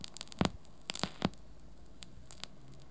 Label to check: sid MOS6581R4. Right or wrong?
Wrong